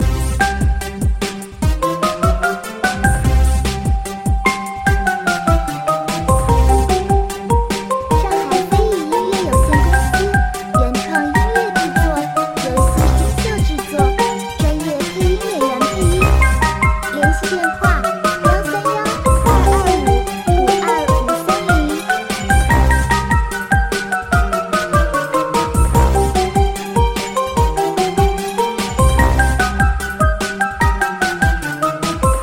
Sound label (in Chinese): 电子Q版可爱欢快战斗; 月宫